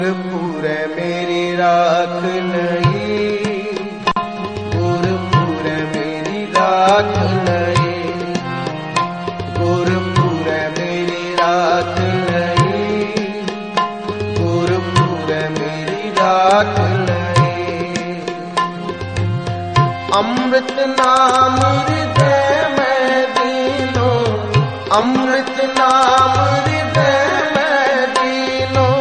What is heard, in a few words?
gurbani devotional punjabi